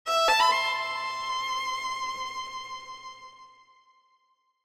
Sad Violin Phrase

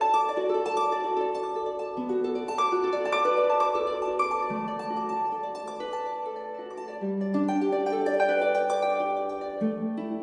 Magical Harp Carefree Melody Loop D Major

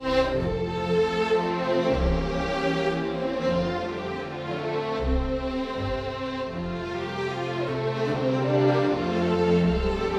Strings F maj romantic classic melody

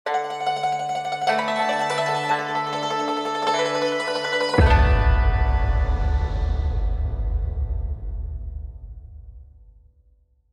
Japanese Gardens Strings Deep Boom; Unrealsfx; Travel Logos

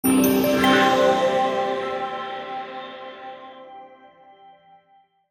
Morning Chimes

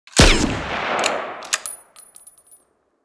shot gun

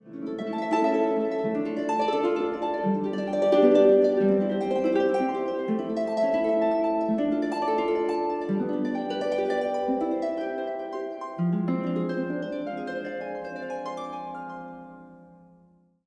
Harp F maj full phrase; Artlist Original

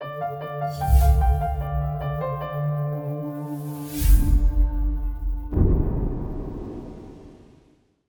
Melodic Logos Piano Stinger Whoosh Dramatic

